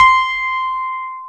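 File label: YAMAHA CP70 C2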